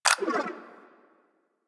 Briefcase Akimbo Pistol Mech; Warframe; Weapons; Corpus

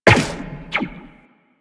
Step Zipline, Footsteps; Walk; Warframe; Tenno

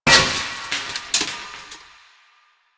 Chair Stack Destroy; Warframe; Ambience; Nineteen Ninety Nine

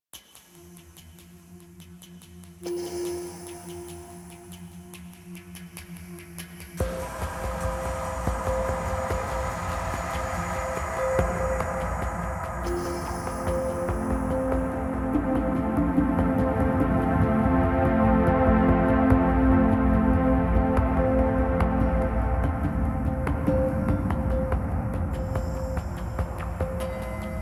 Hub Cue, Music; Warframe; DE; Hubs; Zariman

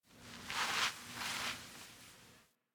Foley Idle Get Up; Enemies; Kubrow; Warframe

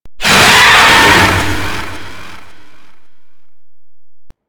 Golem melee head attack swing, enemies; Warframe

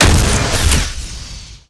Flak Cannon Alt Fire, weapons; Warframe; UT Flak Cannon

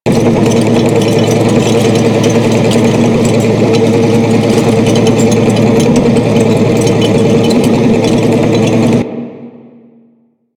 flying robot loop; Warframe; Veilbreaker; Quests; Ambience; Kahl

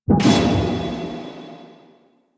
Player Spotted Damage Taken; Ballas; Warframe; Chimera Prologue

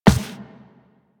Walk Default, Footsteps; Warframe; Operator

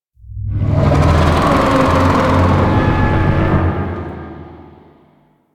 Void Dragon Vocal Pre Death; Enemies; Vocals; Warframe; Duviri

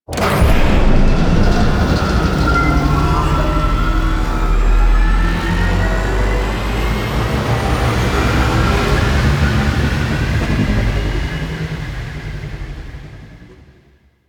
Reliquary Engine Start; Warframe; Rail Jack

